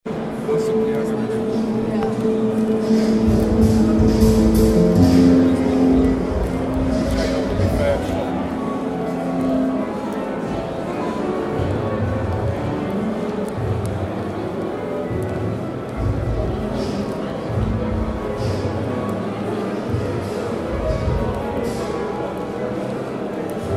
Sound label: piano; messe frankfurt